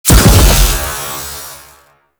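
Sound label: Alt Projectile Explosion, Weapons; BE Tetra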